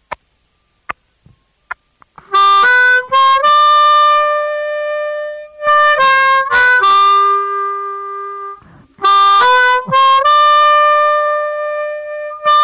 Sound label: blues lick, harmonica